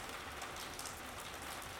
rain; wob2 demo

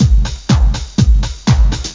breakbeat; World of Sound; instruments; beats

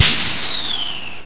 Missile

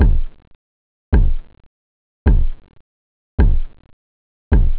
short, drums techno; OctaMED SoundStudio V1

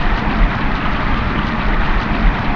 Water Skid Int; RallyXtreme; Surfaces

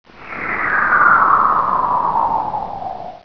Snake Invocation; Ultima III; Ultima Sounds

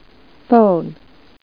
PHONE; KidPak

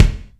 kick; DirectX SDK; DMusProd; lbkick

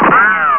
Ricochet

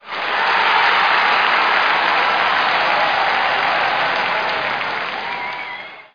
clap, stadium, hockey

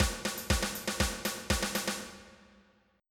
MARCH; Sounds Terrific II

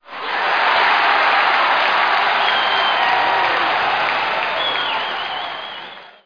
clap, stadium; hockey95

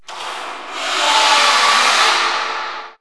squash, citylife fun; Kaboom! Junior

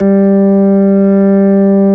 B3, hammond; Timidity

